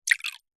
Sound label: food drink spirit or water pour into shot glass